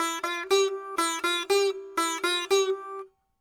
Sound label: sitar